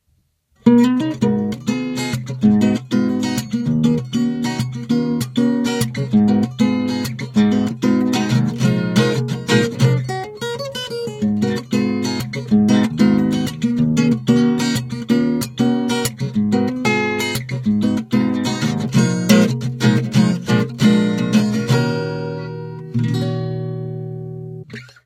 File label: guitar; Soundscape Overhaul